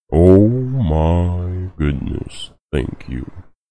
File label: follower alert; dlive